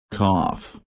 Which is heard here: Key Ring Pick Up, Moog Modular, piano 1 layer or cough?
cough